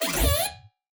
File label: squeal bass